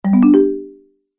Message Alert, beep

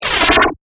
Laser Hit Warble

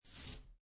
Poker Chips Slide One Chip On Felt; Gambling; Casinos

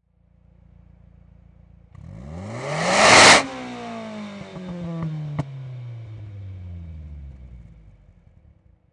Nissan Silvia Exhaust Single Rev; Auto, Power Car, High Performance Car Sound: Nissan Silvia. Exhaust perspective. Motor revving, single engine rev

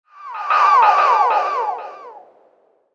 Future Bird, robot sound; The Helion

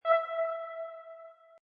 Musical, Button sound or menu sound; Multimedia

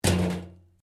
Pipe Tin Move

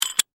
Handle Screw Turn, Handle, Screw, Turn, Metal; Foley; Lock; Hand Tools; BlastWave

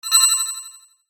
Hit Bright Metallic Delay; Production Element Imaging Element Accent Transition

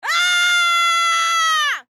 Human Female Scream Long; Screaming (Sound Effects:Voice)

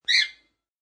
Parakeet Sing, A parakeet's single chirp.; Birds; Animals

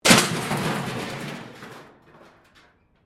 metal rubbish bin smash concrete

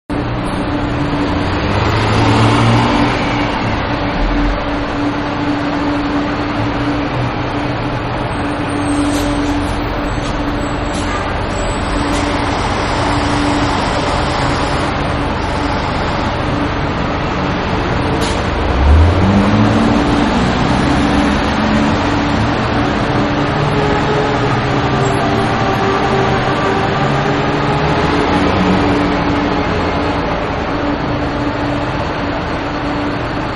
Loading Area, Bus-Terminal; Transportation; Backgrounds; Sound Effects:Backgrounds; Manhattan Production Music

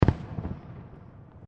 Fireworks Multiple Explosions; Fireworks (Sound Effects:Backgrounds); Crafted Signals

